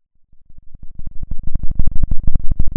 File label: pop, Sound of a thunderstorm